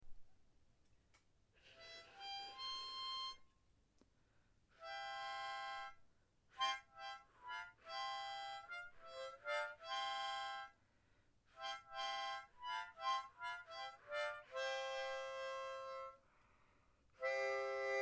Harmonica Key of G

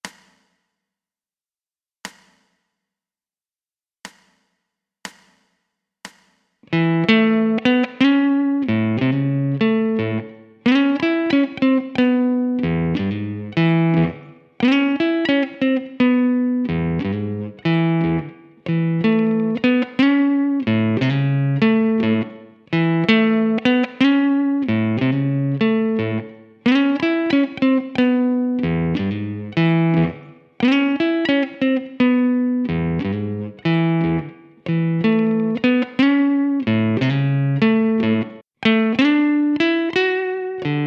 Blues Riff 60 BPM

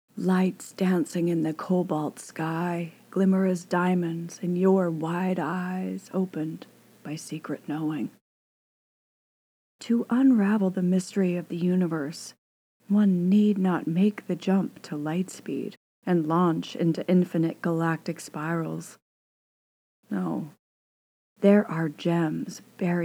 Burst Into Stars

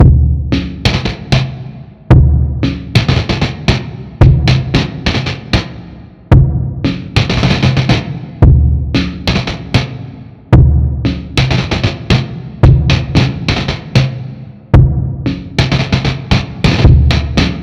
Fife N drum

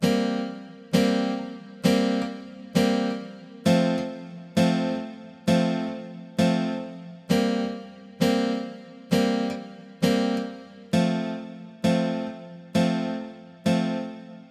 guitar

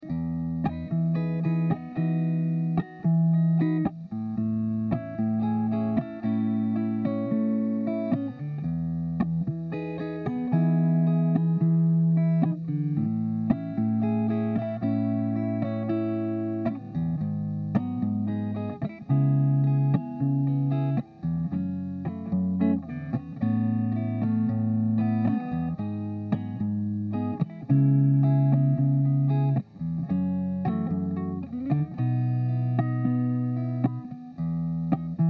E112 Appy Song Chords

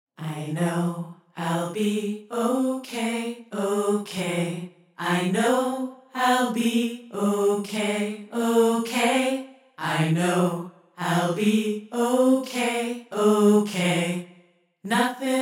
Vocals

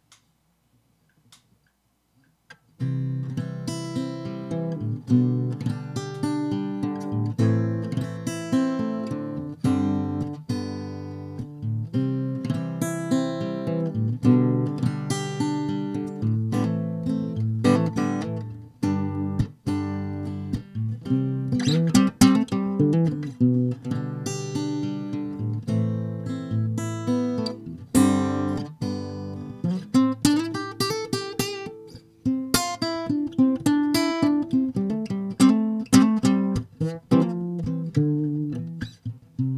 Acoustic Repair Job; admiralbob77

